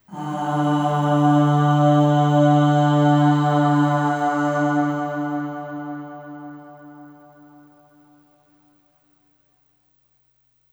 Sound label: Aah Long D2 Long Reverb; Harmonic Building Blocks